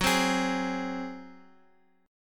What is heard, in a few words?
F sharp Suspended 2nd Flat 5th, Guitar; strummed; JGuitar